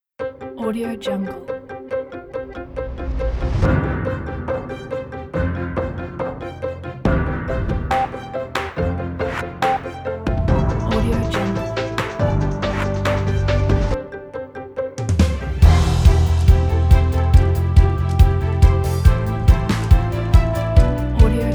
positive realization

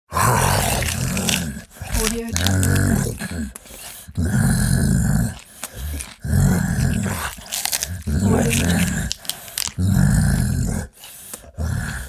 giant ogre eating

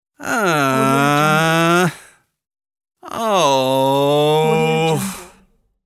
male frustrated sigh

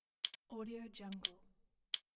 button click

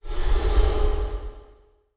supernatural swell; sound-ideas

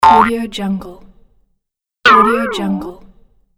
cartoon funny doink

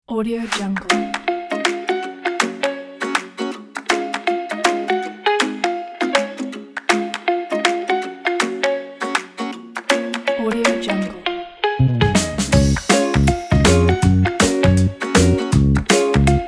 happy music advertising

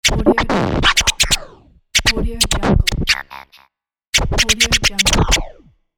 hip hop rhythmic scratch

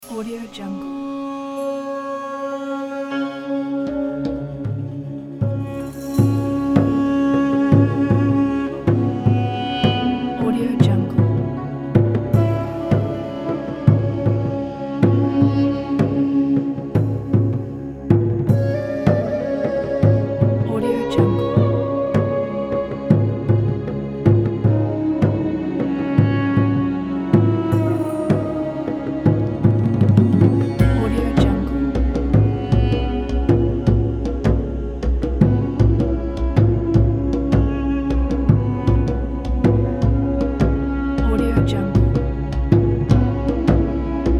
egyptian background

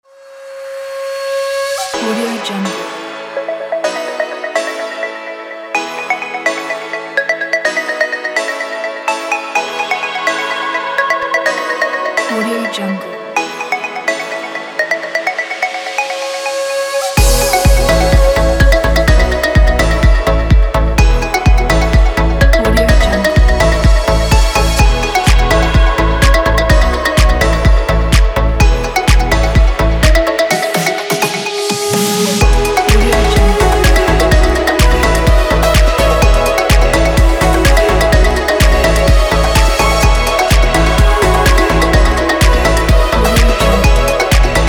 summer holiday

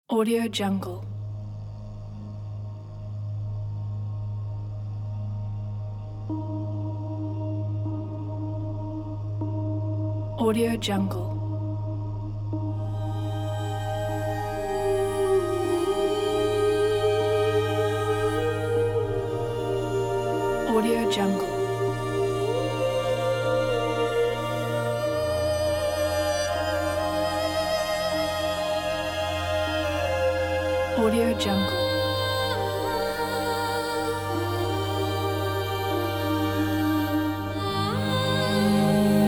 sentimental memories

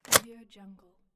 instant camera lens barrel; stormwaveaudio

